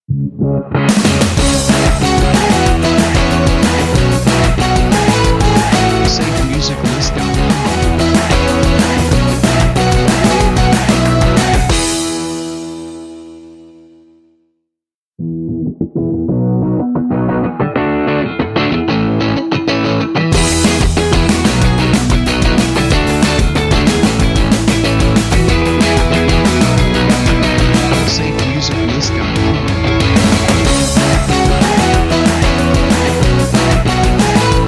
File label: Caffeine Hits Intros; SafeMusicList